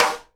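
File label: SIDE STICK, Live Snares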